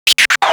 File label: Scratch Fills 140BPM